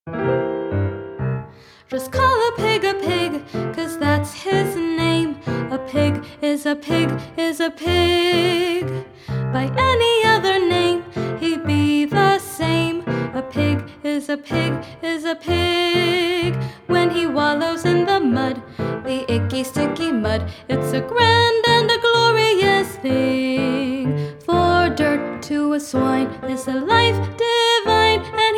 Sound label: Song, songs children sing